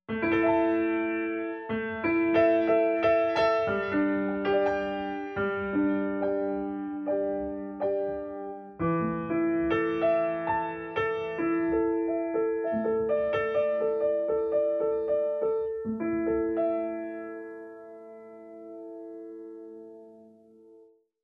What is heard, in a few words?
PIANO